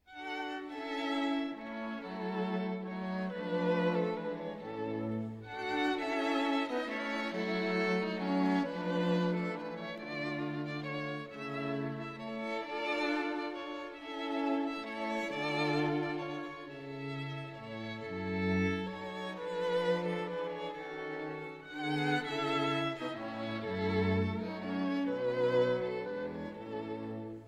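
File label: string quartet a minor; rontgen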